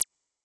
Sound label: short robot whistle